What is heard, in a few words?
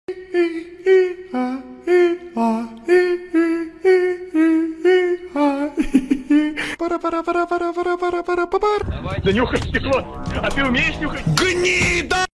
khi kha; igra v kalmara